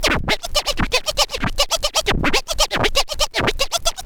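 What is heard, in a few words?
SCRATCH TOOLS; AMG Megabass REMIX! VOL-2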